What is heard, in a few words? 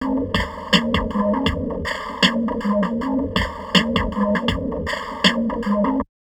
DIST, Drum Loops Crazy Processed; USB Soundscan vol.07; AKAI